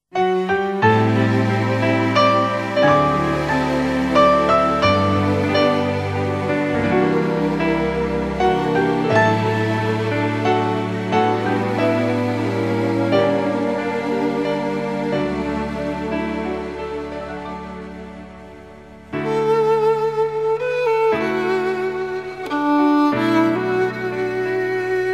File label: Violin